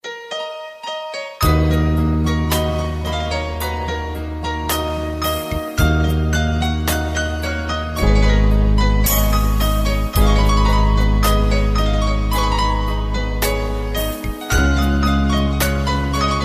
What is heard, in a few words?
Persian Piano